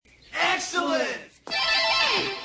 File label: guitar solo; ill and teds excellent adventure